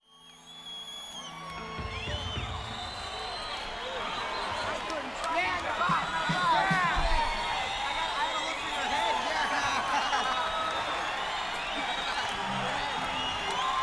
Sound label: Crowd